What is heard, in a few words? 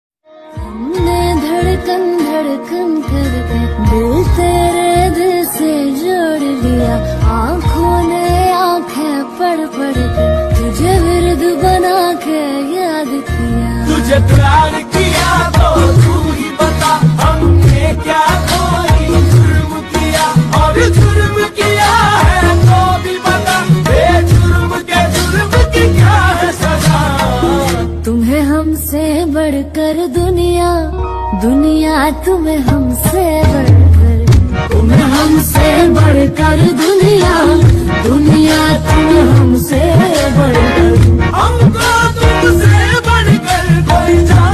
Hindi Ringtone